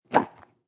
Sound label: Plastic Lid Open, A plastic lid popping open; Kitchen; Household